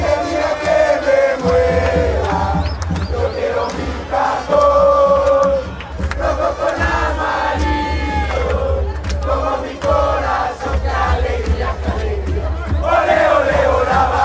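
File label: fanchants, football chants; dale gria dale gria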